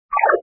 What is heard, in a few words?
item use; anacroz